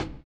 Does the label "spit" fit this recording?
No